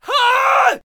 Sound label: Attack Vocals; Squire